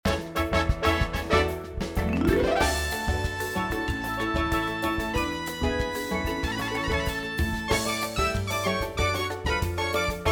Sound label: Scene Change to School Instrumental; descendants